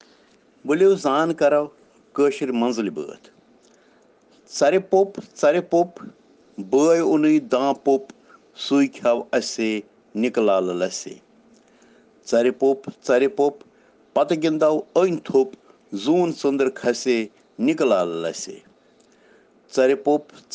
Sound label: Children Rhyms in Kashmiri